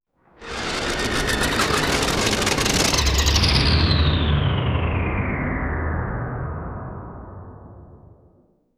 a suspensful fade in and, background music

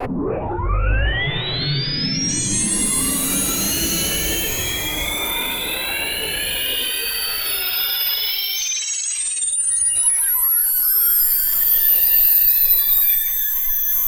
scifi alien ufo zooming

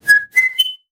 cartoon metal whistle

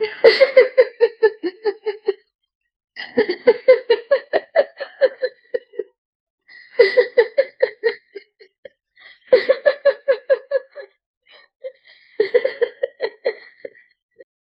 crying; Lisa Simpson; cartoons

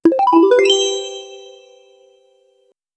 replay or try again sound

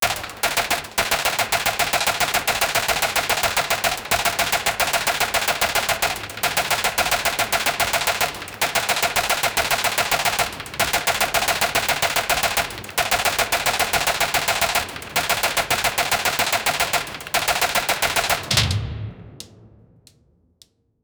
trap

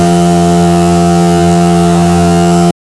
loopable sound, kart

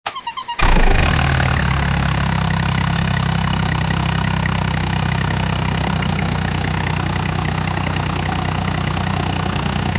a GM 350 engine boat; sound effect

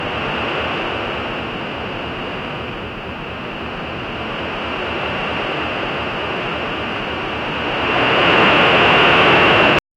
gentle static fades in so, radio tuning

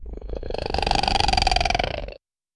small troll creature; gag

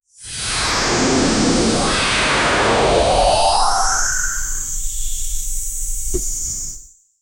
inter dimensional portal

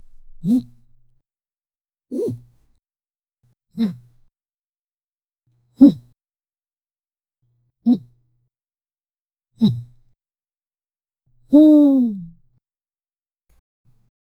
the sound one hears when, body

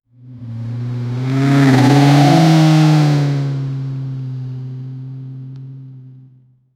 nissan gtr drifting close

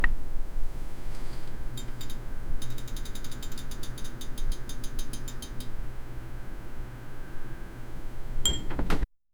hover menu sound, menu

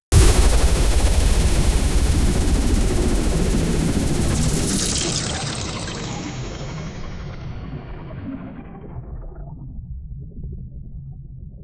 rocket ship that flies, children's sounds

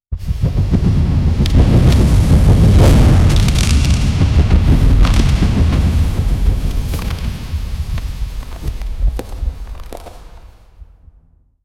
massive earthquake shaking a city

